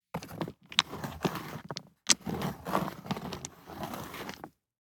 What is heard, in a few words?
DICE on Neoprene, Slide, Standard, Three Dice; Samples; ArticulatedSounds; DICE Sfx Party (Extended)